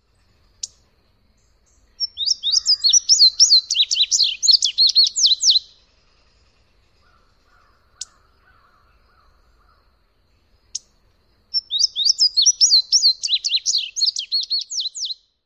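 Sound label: song and male chip, bird; KY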